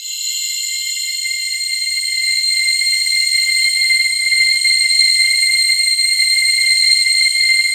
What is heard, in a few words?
JV feedback, JV-1080; Syntec; Wall of Sounds